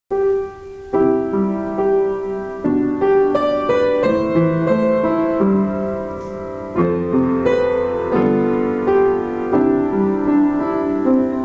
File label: lord s prayer music only